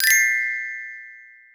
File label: Glock; Orch Perc and Stings; cartoon; musicradar; cartoon caper